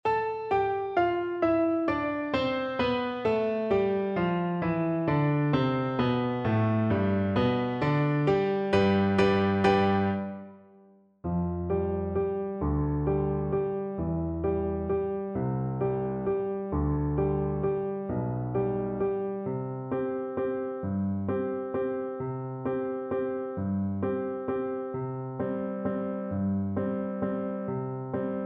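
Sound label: recorder karaoke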